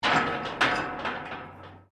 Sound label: metal rusty move; Materials